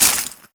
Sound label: foley